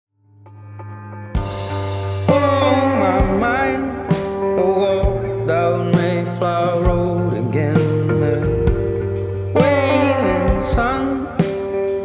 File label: slow grooves